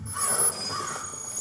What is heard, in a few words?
Crow; CODBO4